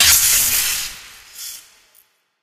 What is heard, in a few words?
spark, coop electric; player